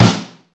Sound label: Snaredrum, Korg T3